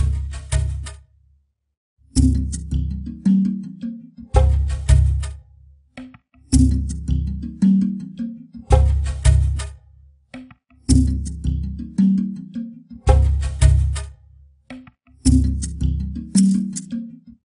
Jungle Level; sim theme park theme park world 1999 pc gamerip

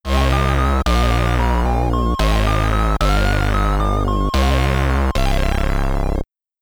Game Over (SID Stereo)

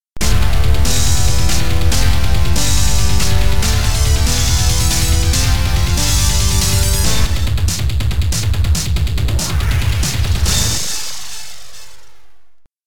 Area Clear; Rebel Runner: Operation Digital Code; DOS

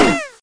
Hit; progressbar95

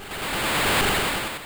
Encore; Pokemon; Gen 2; attack moves; GSC

